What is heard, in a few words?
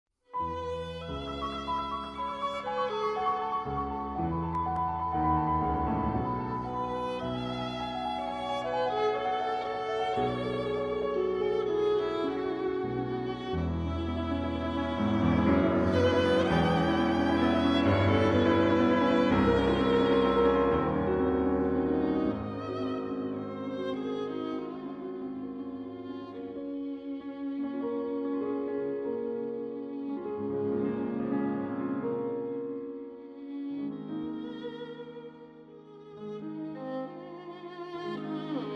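violin sonata; janacek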